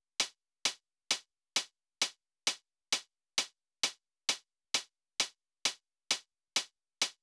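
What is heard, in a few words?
132 Closed hi-hat, Trance; Zero-G Trance Inducer; Construction Kits; 132bpm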